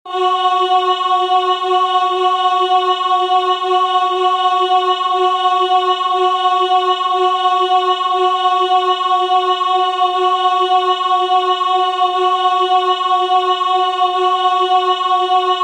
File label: Vepres 1610 Alto; Monteverdi; Vespro della Beata Vergine (1610); Deus in Adjutorium; Baroque